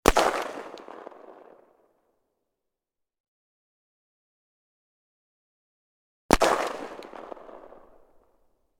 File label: gun tikka; still north media